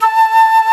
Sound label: Flutes, Flt amb; Roland LCDP04 Orchestral Winds; 90 s Sample CDs; C Flutes 3-8